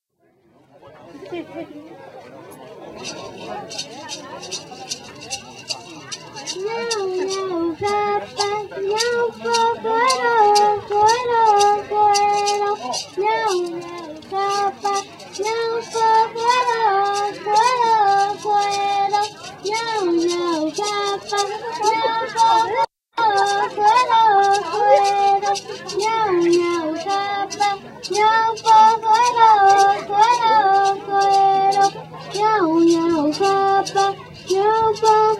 YOUNG NATIVE CALIFORNIA GIRLS Singing Live Cultural Songs; The young Native California girl singing into the microphone is the daughter of a famous Kumeyaay San Jose de la Zorra basket weaver; Indian fiesta in North America